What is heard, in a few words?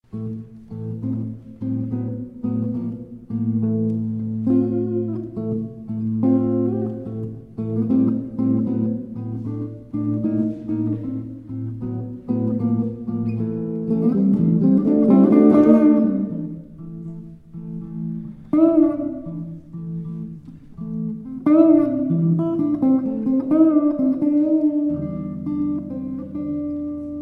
Blue Shuffle Electric